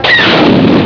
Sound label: empty recycle bin